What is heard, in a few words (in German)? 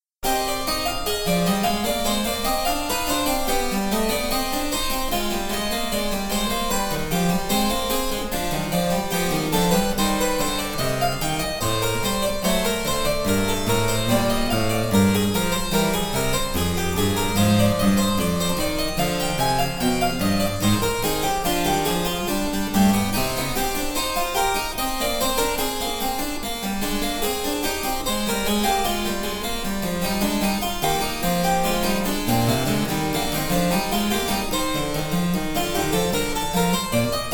Cembalo; Mark Lindley WTC 1